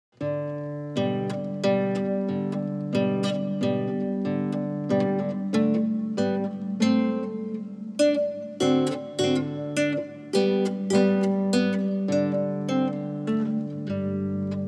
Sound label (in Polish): strojka gitarowa